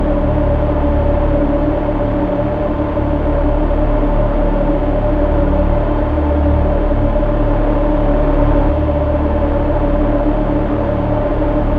Pinata loop

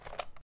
FSP9 ADS Out

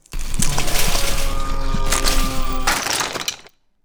death

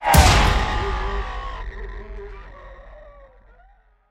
jumpscare

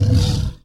Attack

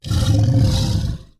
Attack

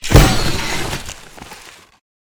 Lunge Landing Successful

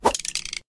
swing

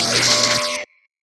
Charge Swing

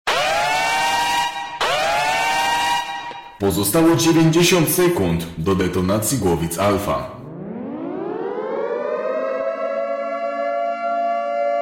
Warhead + Theme; Northwood; SCP SL

